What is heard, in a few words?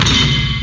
drop; protris